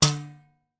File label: industrial spring twang mechanical